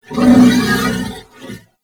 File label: Horse Vocal Screech Stopping; Vehicles; Warframe; Duviri